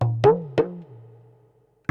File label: PERC LOOP ETHNO 125; eJay